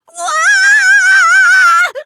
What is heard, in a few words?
whaaa; tenko